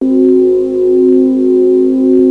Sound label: vulcan bell